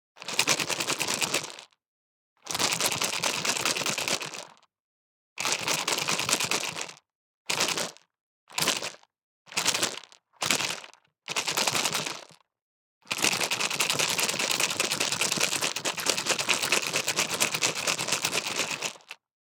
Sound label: DICE in Leather Cup, Shake, Sixteen Dice; Samples; ArticulatedSounds; DICE Sfx Party (Extended)